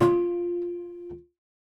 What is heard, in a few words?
Prepared Piano